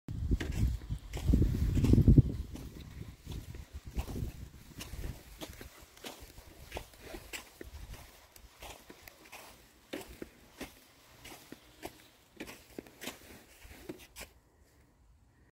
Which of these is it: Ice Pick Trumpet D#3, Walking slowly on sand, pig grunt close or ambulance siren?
Walking slowly on sand